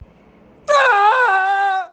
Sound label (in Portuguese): grito